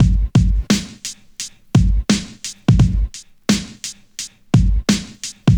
86 bpm old school breakbeat g sharp key, Free breakbeat sample - kick tuned to the G# note.